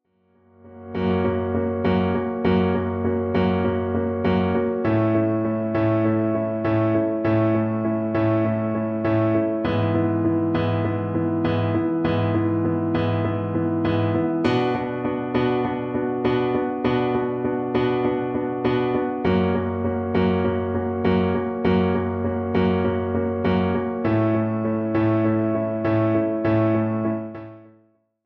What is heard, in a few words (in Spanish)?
tango argentino